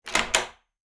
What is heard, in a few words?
Door being locked